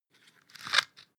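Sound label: floss pull out squeak, bathroom; haus-garten; badezimmer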